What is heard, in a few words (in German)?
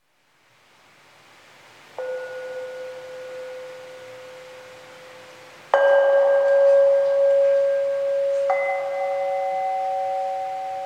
heiliger gesang